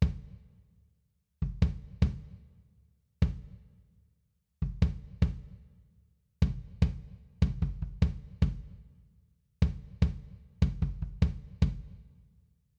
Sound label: drums kick